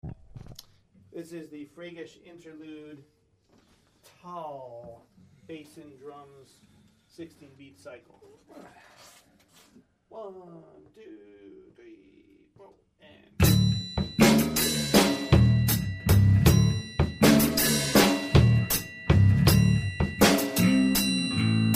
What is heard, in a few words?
freigish interlude tal band